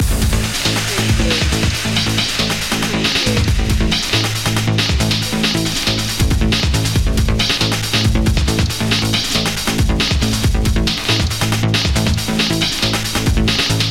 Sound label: trance dnb loop